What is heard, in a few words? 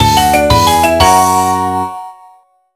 SUCCESS; Mots Croises 2